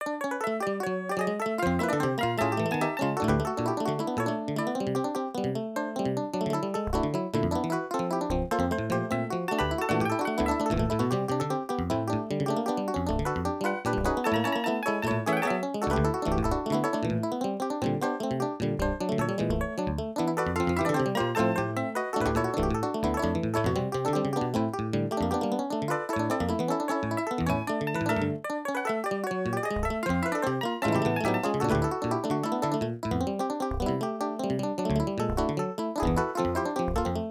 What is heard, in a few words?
bonus room; NSMBW